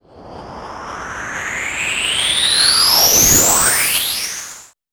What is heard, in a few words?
fx reverse; Ueberschall; Techno Trance Essentials